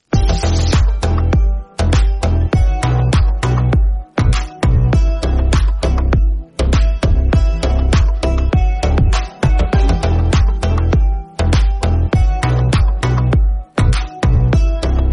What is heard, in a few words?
bgm theme cover